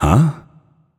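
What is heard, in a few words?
non verbal confused, voice; cernunnos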